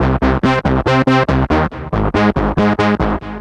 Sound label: NRG Lead, trance